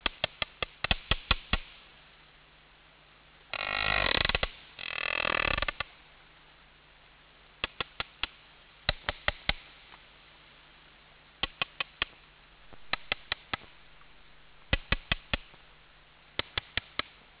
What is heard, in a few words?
codas, whale songs